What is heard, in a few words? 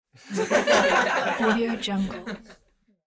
teen small group laughter